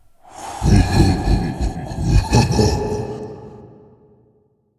chuckle; nihilanth

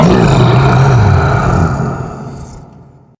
pineapple scream

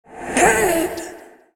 sword hit

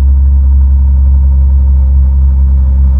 idle, vehicles, laferrari, salon; tralalelo ferrari; sim fphys fer laferrari

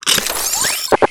quest alert

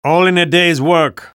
spy cheers

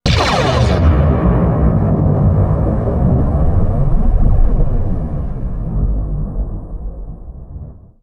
thunder, halloween ambient; Garry's Mod; tf2

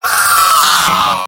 engineer pain critical death; tf2; mvm

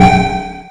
chime neg; ui

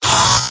scout pain sharp; vo; tf2; mvm

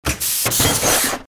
toolbox open; taunts; engineer; tf2; engy

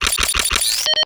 quest status tick bonus

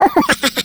skelly small

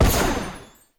laser

